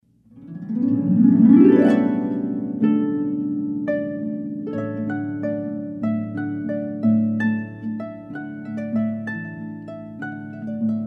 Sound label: Water Music Hornpipe